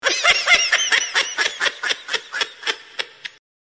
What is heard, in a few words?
Baby hilarious laugh meme; shorts sound effects